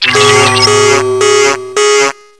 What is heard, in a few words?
bomb launch; nst zombie